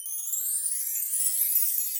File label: Chimes A Finger up, Latin Percussion; Drum Hits; Bell; Ableton; Factory Packs